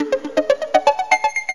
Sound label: PERC FX